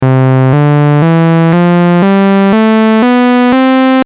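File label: filter hi-hat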